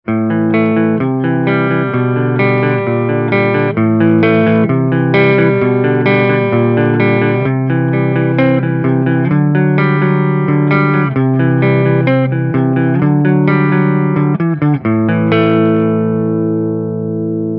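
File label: Fame Paul IV IF Bridge clean, guitar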